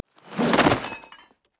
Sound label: death emote; Darius